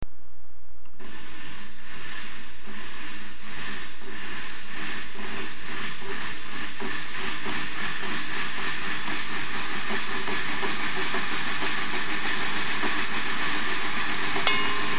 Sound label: Train